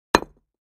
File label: Patio Block Hit Sledg, concrete & brick